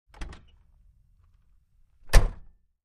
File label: Wagoneer: Front Door Open and Close, Jeep Wagoneer